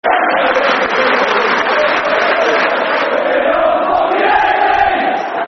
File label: We All Hate Leeds, Football Chants; Derby County Dcfc